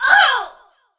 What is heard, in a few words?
Archer Death; Ferea